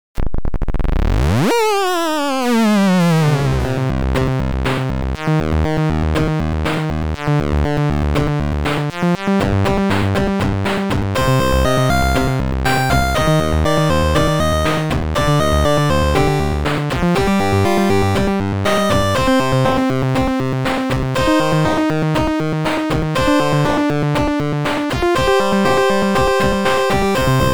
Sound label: 8bit Elevator